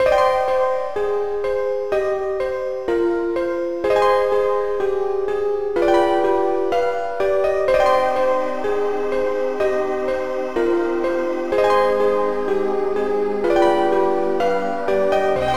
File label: piano viol